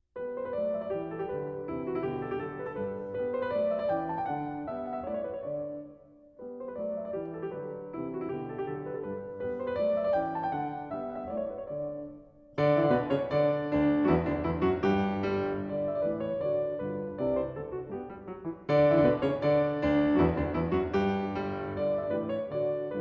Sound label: piano sonata no 25 in g